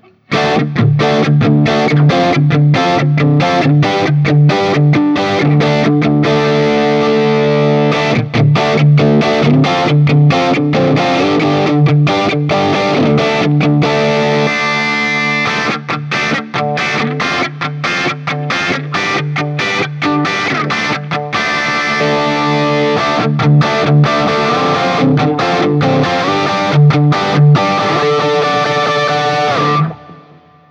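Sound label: Guild S300AD JCM800 D, guitar; sunburst Guild S300AD guitar; Axe-FX Ultra setup through the QSC K12; D-Shape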